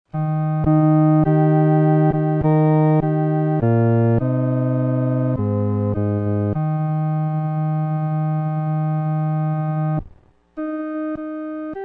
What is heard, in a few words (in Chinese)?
独唱 第四声